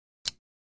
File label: Free Weights Exerci